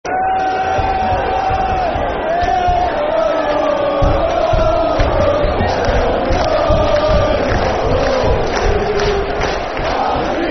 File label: Football Chants; Birmingham City Bcfc